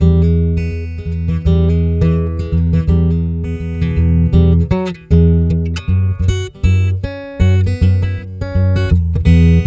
guitar piezo filt through invfz

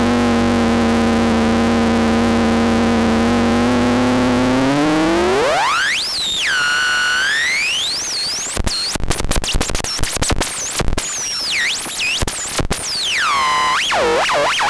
saw freq; audiocubes

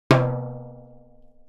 timbales; dsp2008 sound library

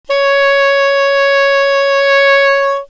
Soprano Sax ff Db5; dsp2008 sound library

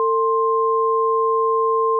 sinetone

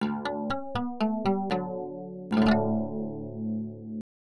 gtr nylon

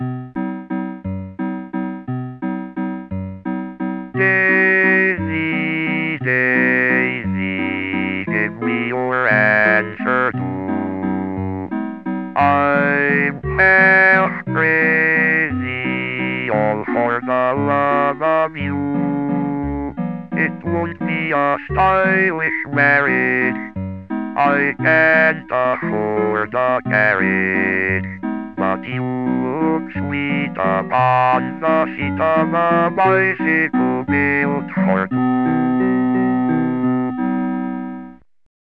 synth; synth lec

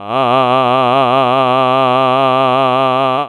press a

